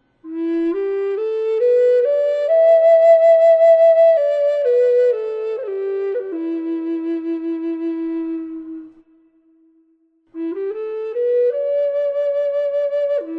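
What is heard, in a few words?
Tiger E, flute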